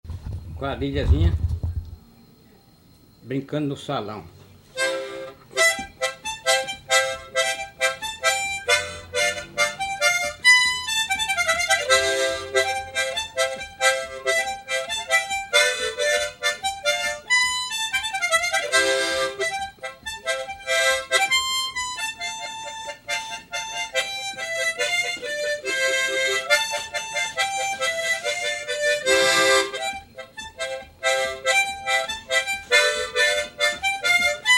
gaita; brincando no salao

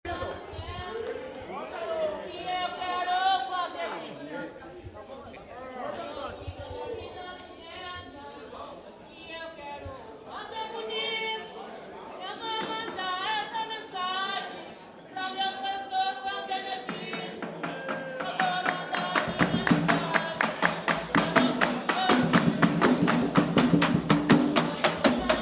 batuque de umbigada; tiete; me da licenca

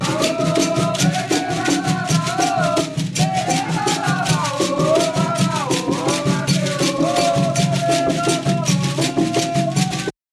tambor de mina; thoya jarina

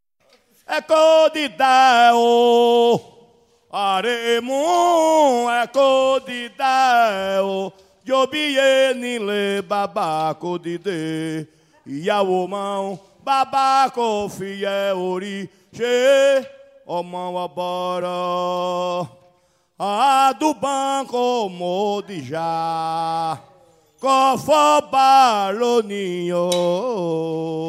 toada para xango

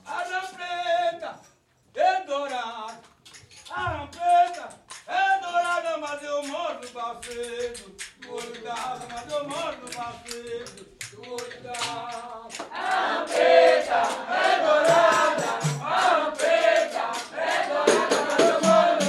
fanti baiao de princesas dourada; Baião de Princesas é um ritual especialíssimo e único que acontece apenas uma vez ao ano

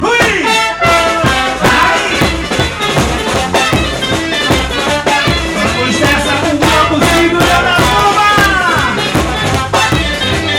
frevo e ciranda

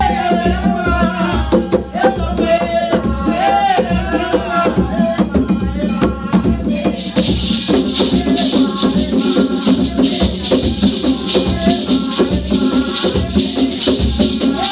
terreiro de xango

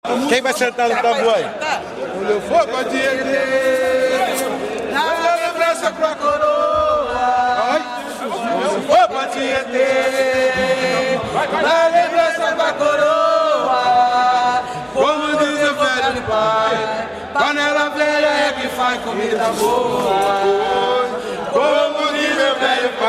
batuque de piracicaba quando for pra tiete

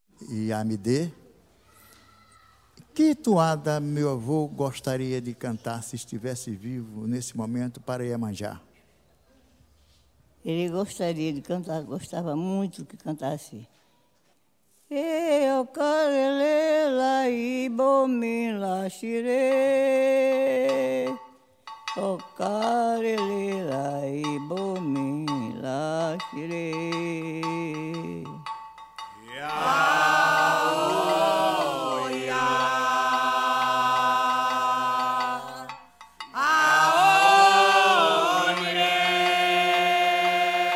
toada para iemanja

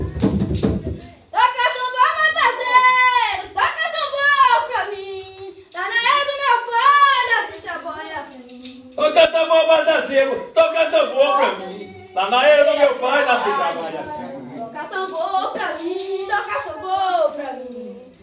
tenda sao jose toca tambor batazeiro

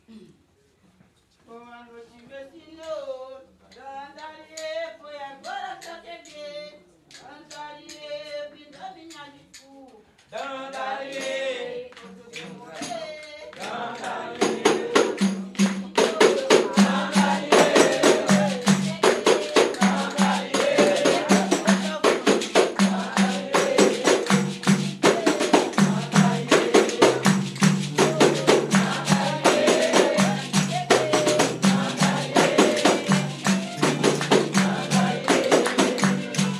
fanti baiao de princesas boa noite; Baião de Princesas é um ritual especialíssimo e único que acontece apenas uma vez ao ano

